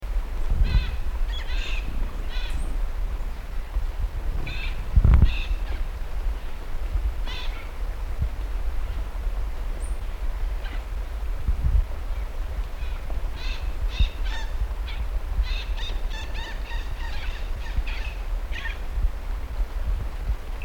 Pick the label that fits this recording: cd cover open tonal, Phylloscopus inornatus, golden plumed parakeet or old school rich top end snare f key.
golden plumed parakeet